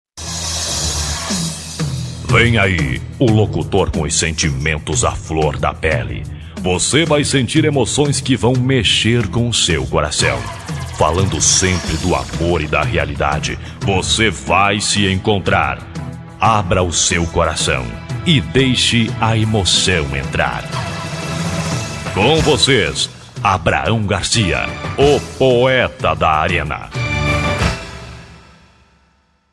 abertura

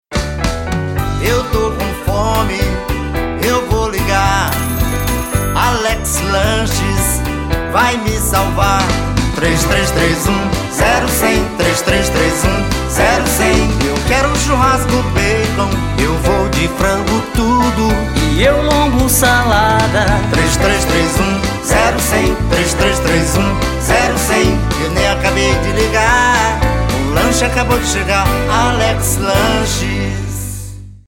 jingle comercial; alex lanches

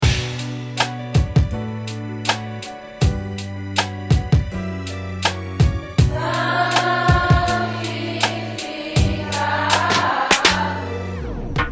rap

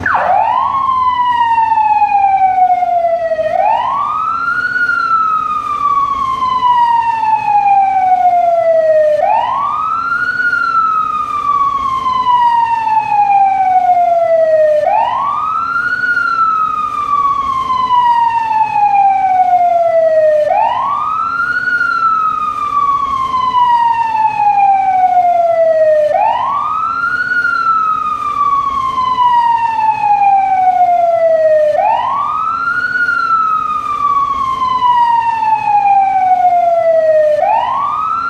sirene wail bombeiros

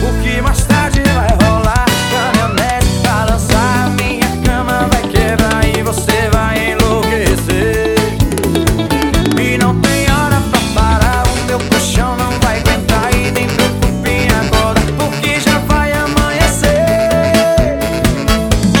toque de celular caminhonete

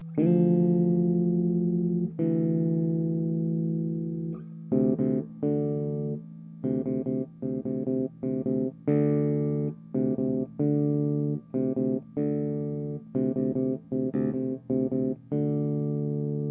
tema capoeira bass; trilhas para filmes

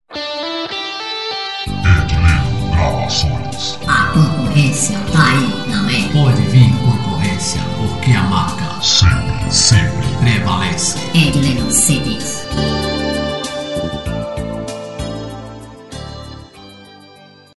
vinheta

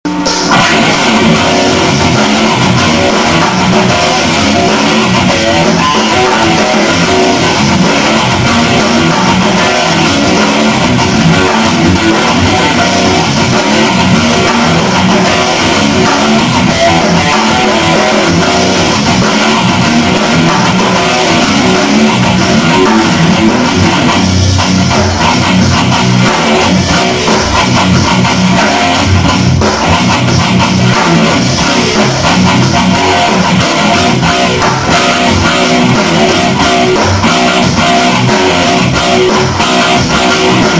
improvisação ao vivo

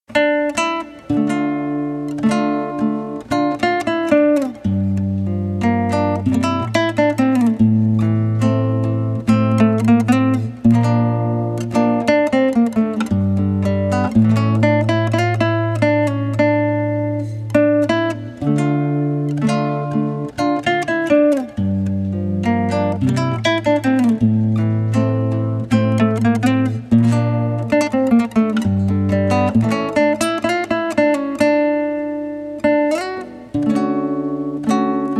flautas e cavaquinhos

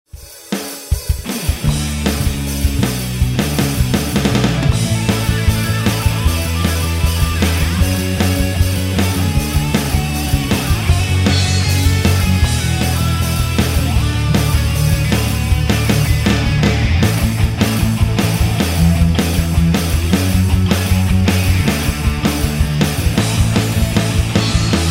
toque celular

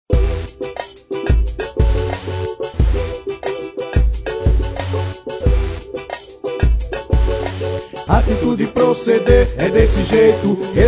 hip hop

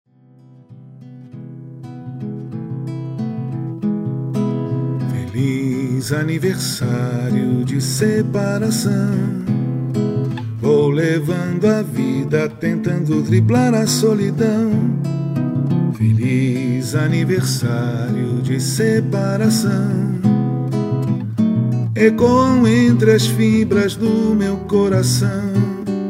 viola

